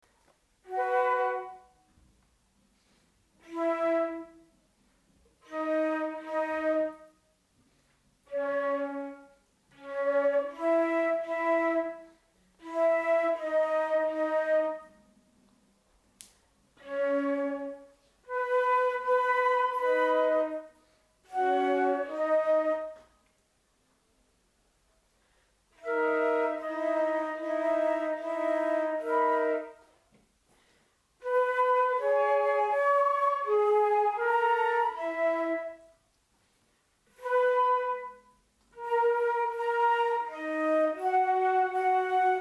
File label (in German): Planetenmusik; astromusik